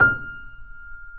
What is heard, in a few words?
F 5 off, Grand Piano off mic; AKAI S6000 CD-ROM - Volume 2